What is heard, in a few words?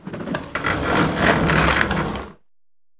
office chair roll